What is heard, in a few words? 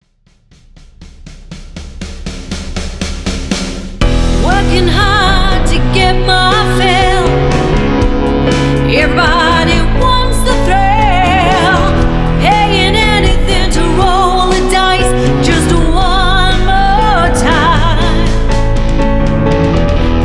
Function band